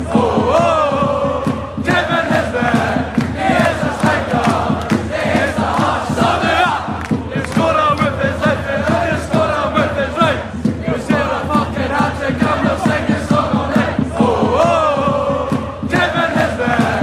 hes a striker fanchants; kevin nisbet

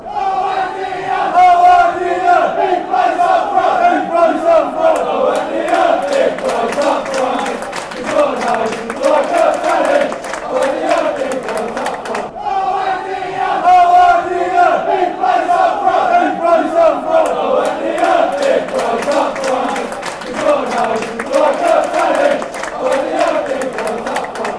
funny charlton, fanchants

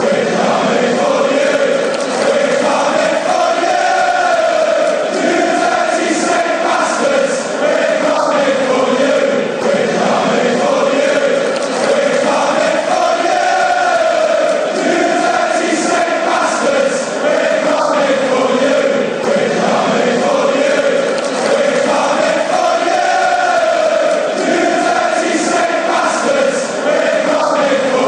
were coming for you, fanchants

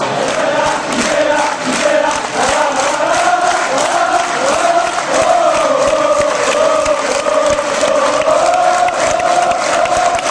ooooooh, fanchants; padova

